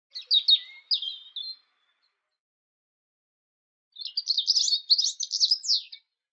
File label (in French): oiseau rouge gorge